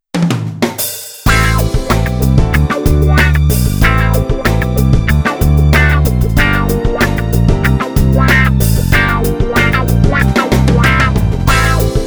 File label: patch demo